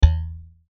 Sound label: Mouseover Low Spring Open; Multimedia; Blastwave